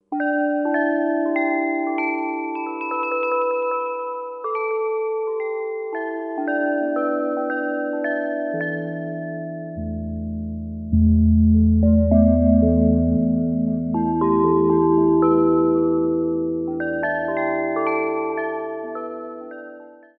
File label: Temple Bell, Roland SR-JV80-14 World Collection Asia